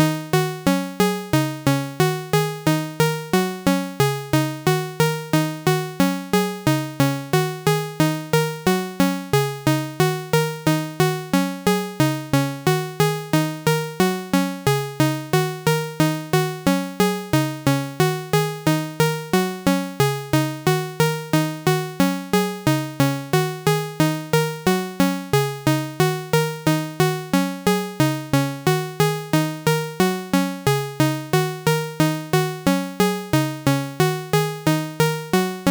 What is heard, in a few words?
glitch